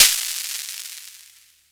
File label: Noise Crash Block; FREE SFX PACK VOL 3